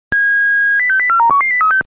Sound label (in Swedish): Polisradio